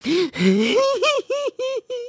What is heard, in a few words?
cry